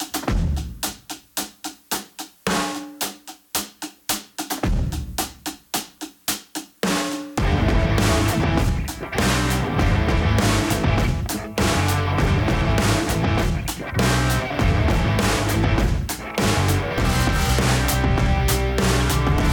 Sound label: Guitar 100bpm A minor; song in a hat 2025